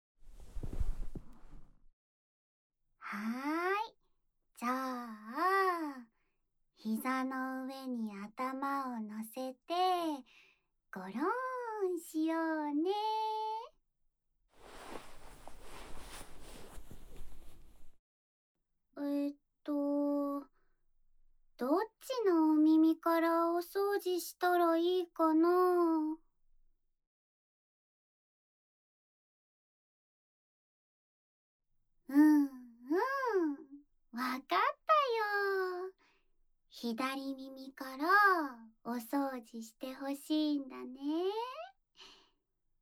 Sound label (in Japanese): 竹耳かきで左耳のお掃除; いっしょぐらし 〜ゆるふわ彼女編〜